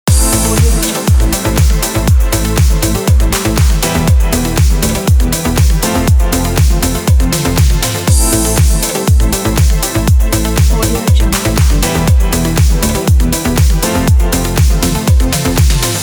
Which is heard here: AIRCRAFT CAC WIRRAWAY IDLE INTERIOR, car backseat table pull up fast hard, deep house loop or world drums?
deep house loop